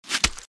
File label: Hit by arrow